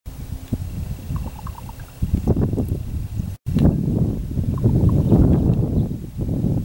Raven Tok Tok Tok Sound

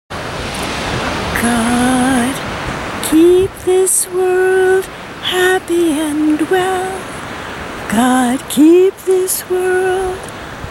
happy n well song

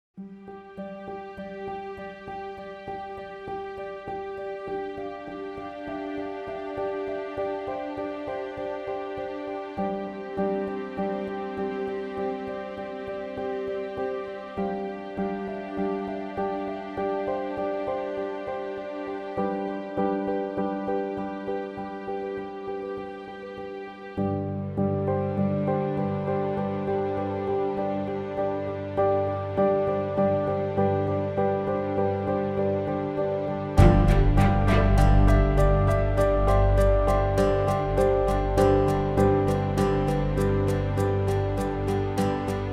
peace morning

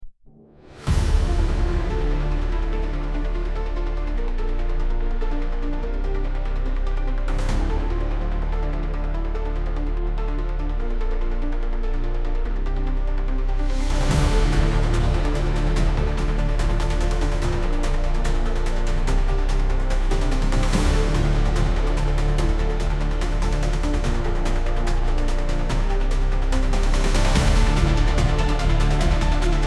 exciting dragon fight

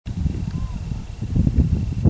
Ravens Tok Tok Tok and Hawks, Raven Warns Hawk

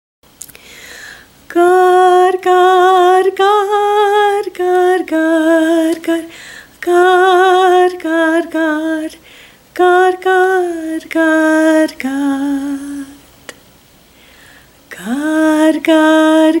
god song

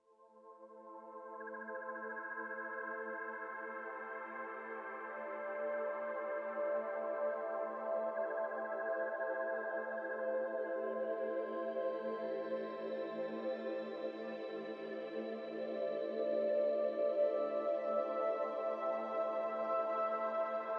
healing forest

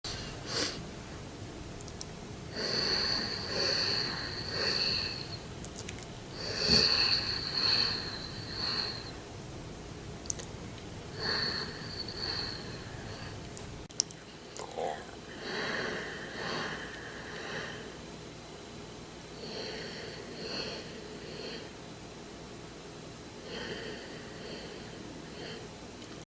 aids virus sound

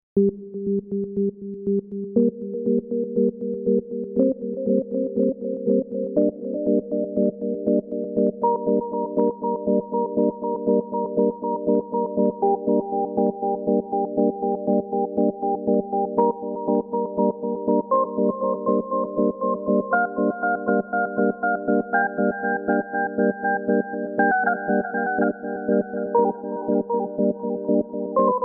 confused mind

